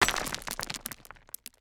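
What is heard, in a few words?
dice roll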